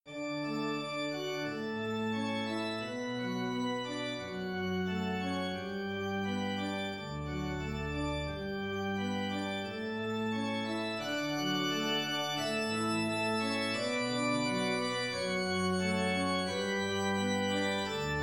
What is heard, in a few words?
Canon; Pachelbel